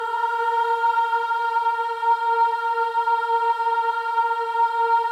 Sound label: BOYS AHS, Symphony of Voices